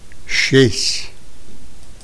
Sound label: SEALION, steller sea lion; she's steller sea lion